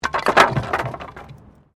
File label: Wood Fall